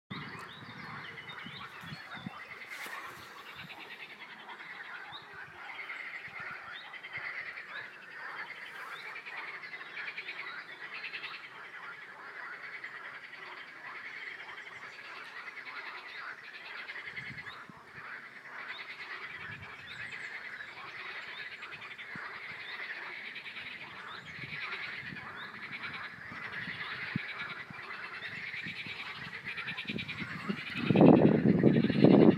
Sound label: frogs or birds